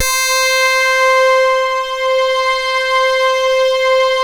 KORG DELTA, LEADS

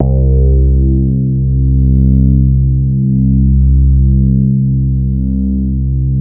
C3, BASS; Q Up Arts; Dream Experience; BASS BANK 5

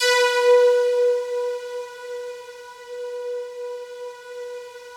FOGATK1 B3, Yamaha VP-1; Music Store; 90's Sample CDs; FOGATTACK 1

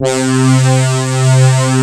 XP SYNTHS; Pro-Rec - Synthsonic; JV ANALOG

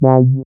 SYN MC WAH, Roland MC-202; Sound & Vision - Gigapack I CD 2

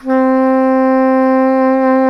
SAX SOP SAX, Soprano Long

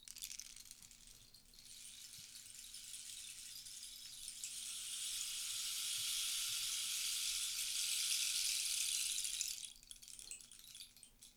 RAINSTIK; PlatinumPhattCD2; E-MU Producer Series Vol. 8 – Platinum Phatt; Flexis Etc

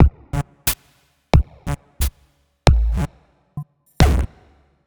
Gated Beat (90BPM); creative gate; Loops; musicradar

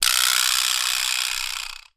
vibraslap

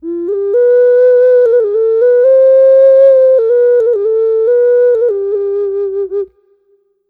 Gourd Occarina

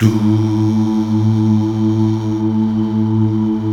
Mal Duh; Best Service Gigapack 1

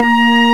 FLU PAD, FAIRLIGHT CMI IIX WOODWIND

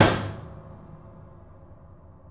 KEY LID -R, The Piano; InVision Interactive

